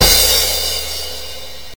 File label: dong